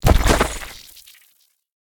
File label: impact; smite; ahpuch skin10